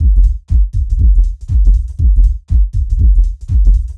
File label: Music Distant Car Deep Loop; SFX Kit; Foley - Misc